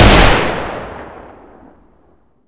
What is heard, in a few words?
fire, desert eagle; weapons